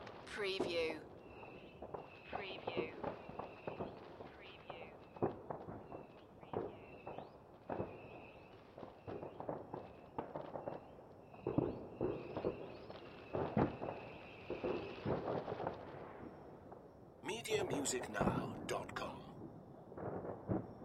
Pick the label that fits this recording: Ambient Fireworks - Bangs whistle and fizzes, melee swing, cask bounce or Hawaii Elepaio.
Ambient Fireworks - Bangs whistle and fizzes